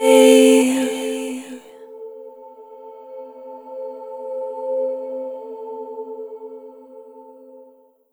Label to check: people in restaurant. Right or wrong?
Wrong